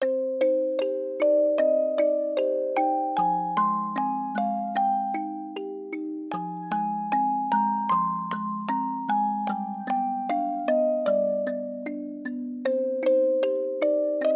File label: Music Box